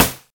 Focused Snare C Key, acoustic snare sound tuned to the C note. Loudest frequency: 5092Hz